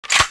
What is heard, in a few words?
mosin start reload